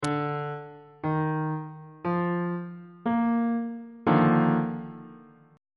d sharp major 7th suspended 2nd 3rd inversion on bass clef